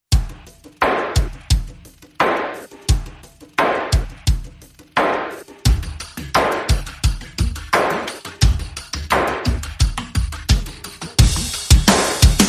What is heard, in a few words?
Percussion Only